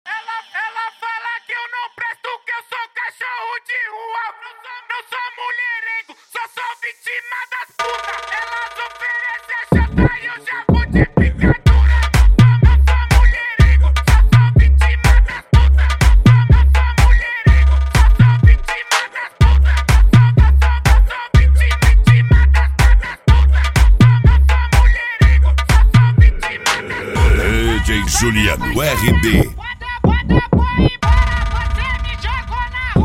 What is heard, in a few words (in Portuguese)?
mega funk automotivo